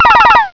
bonus win; SuperFruit

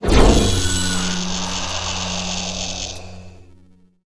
mine; Earth and Beyond; EA Games

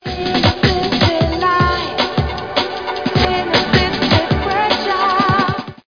Electronica Maximize

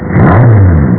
Motor Start; Instruments

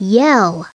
yell

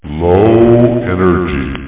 low energ; robot

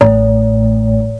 Bong; Cloudz 1.0.0

